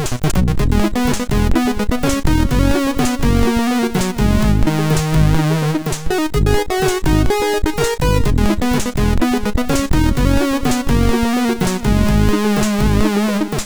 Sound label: Test Tune sid MOS6581R4; hvsc